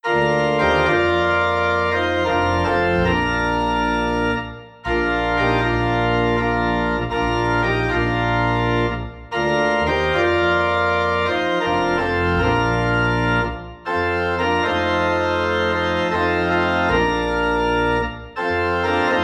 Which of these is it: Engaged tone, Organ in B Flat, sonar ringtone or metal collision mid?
Organ in B Flat